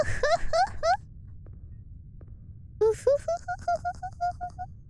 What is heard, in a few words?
huhuhuhuhu